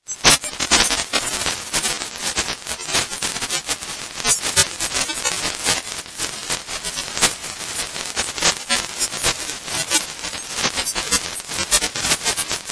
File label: spectral shuffling; Badinerie with Spectral Shuffle; Michael Norris